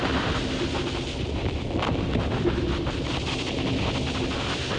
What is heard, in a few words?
Cracklature, sparse soundscape